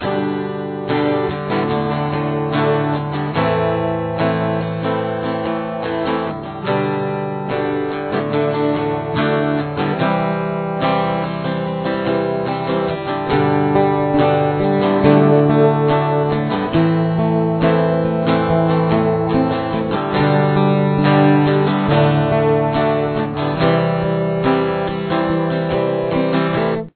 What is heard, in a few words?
full intro